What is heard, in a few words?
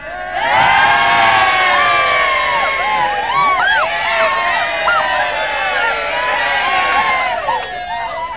cheering; MAZE